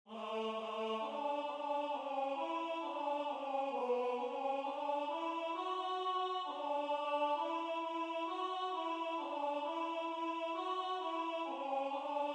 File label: Alto